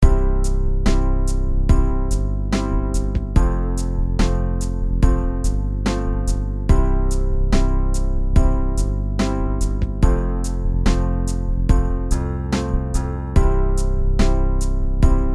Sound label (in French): accomp bass batt piano C et F 72 bpm